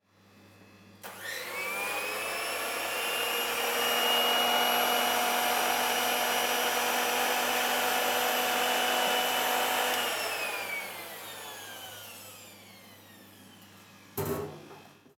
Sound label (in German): Mixer